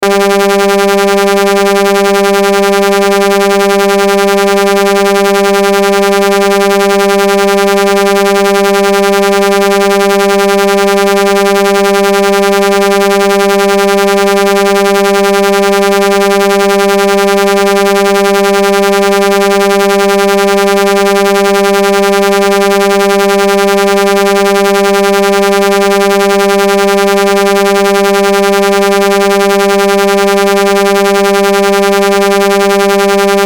Noise Saw Wave Buzz Hum Electrical Pulse; Noise Saw Wave Buzz Hum High Pulse; Electrical Sound Effects

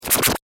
Alien Encoder Scanner; Alien Sound Effects; science fiction sound effect

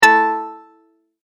multimedia tone exclamation; McKinney Sound

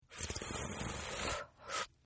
Slurp Female, Drinking; Human; Partners In Rhyme

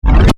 Laser Drop; Lasers

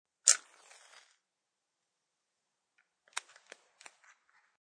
Mtch Flm Out, Match flames and is put out.; Objects

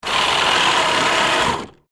Winch Raise Motor, Industry, Machinery; Machines; BlastWave

